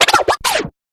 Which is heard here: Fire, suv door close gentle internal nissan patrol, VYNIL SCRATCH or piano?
VYNIL SCRATCH